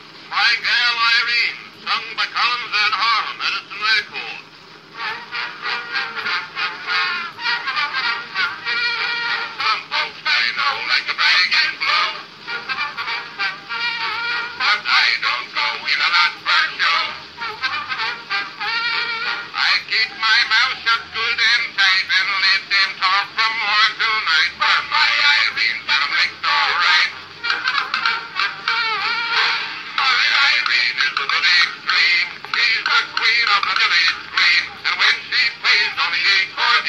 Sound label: Edison Standard Phonograph - 2 & 4 minute; Combination Standard Restored